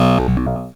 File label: Drums, Hits; DCM8